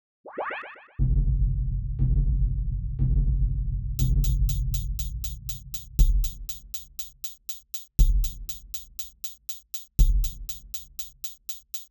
The Sine Wave

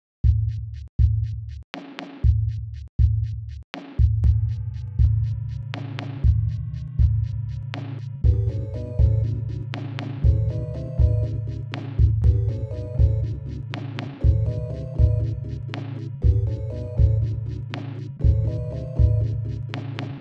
Sinewave

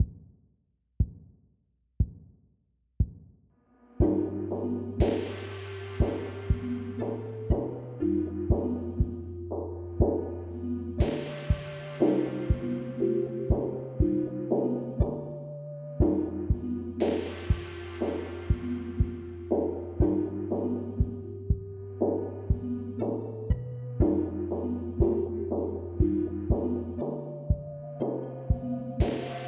Single Sinewave

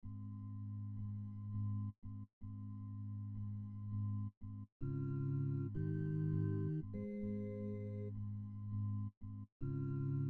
sinewave project

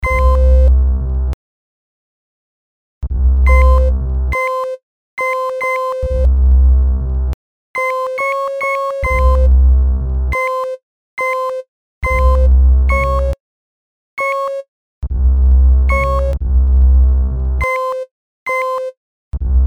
Sine Song

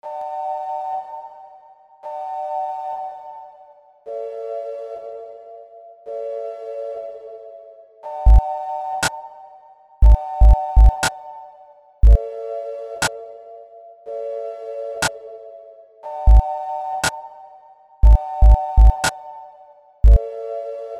Single Wave

